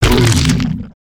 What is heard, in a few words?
on hit, creatures organic